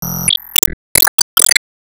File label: Sys Change Finish